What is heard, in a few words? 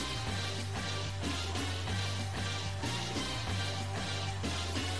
theme short